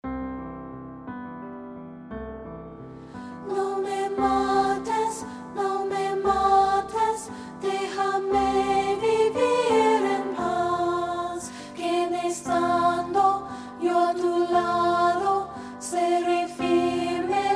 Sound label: VOCAL